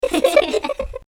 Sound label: Giggle